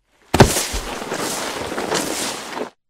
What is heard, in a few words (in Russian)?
padenie s osypju; Звуки падения человека